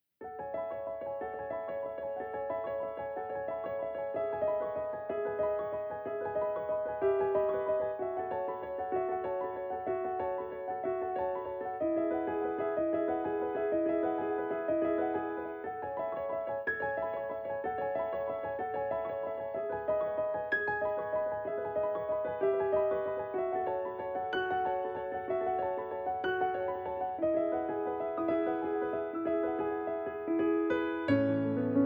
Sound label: standard tuning